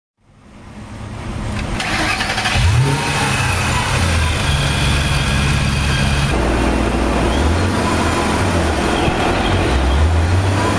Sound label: shelby gt500 super snake dyno pull